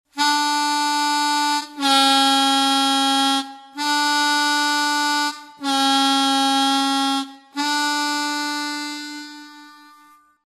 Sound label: bend, harmonica